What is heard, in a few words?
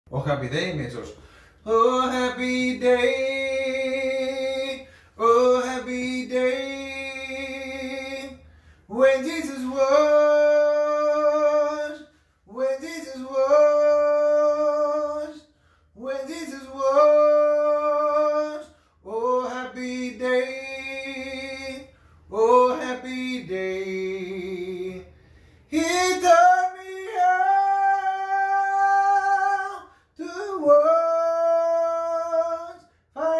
Oh happy day mezzos